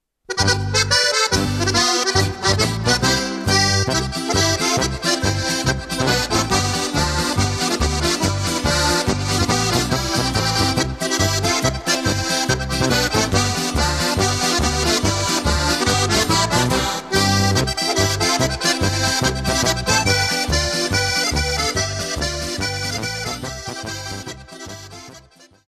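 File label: hey man polka, Steirische Harmonika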